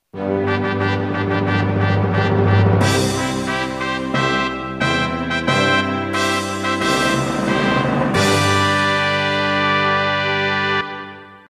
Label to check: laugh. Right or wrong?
Wrong